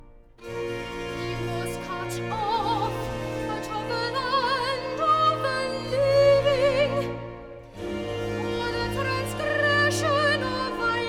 recit accomp; sacred christmas; he was cut off

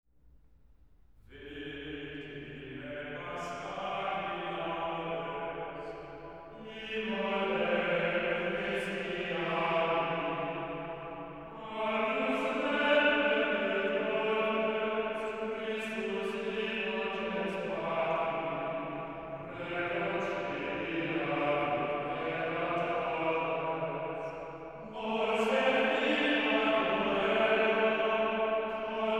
sequenta (chant); sacred choral; venetian easter mass; victimae paschali laudes

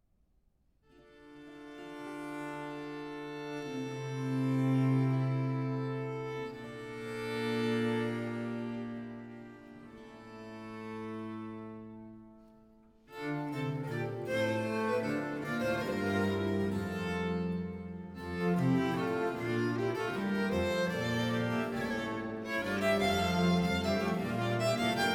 sinfonia; altri canti d'amor; monteverdi madrigals

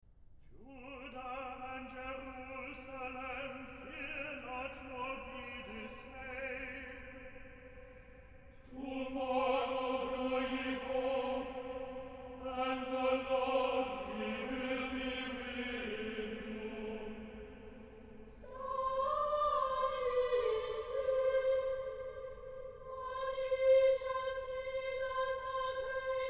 vesper responsory; Advent At St Pauls; palestrina; dearnley

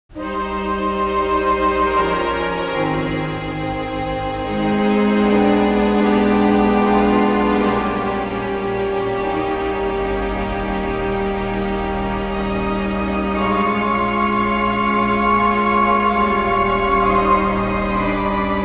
Carillon; in the garden

